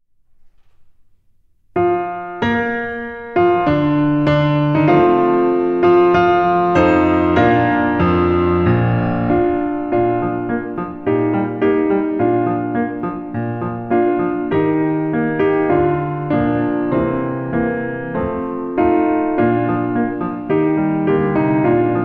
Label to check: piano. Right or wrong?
Right